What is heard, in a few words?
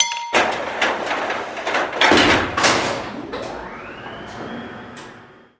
elev start; vendetta; plats